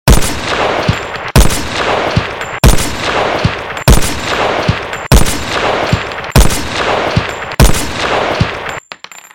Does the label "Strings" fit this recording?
No